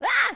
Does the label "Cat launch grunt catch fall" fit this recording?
Yes